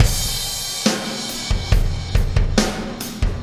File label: Impact Crater Beat, Processed Drumkits